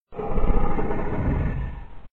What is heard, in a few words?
monster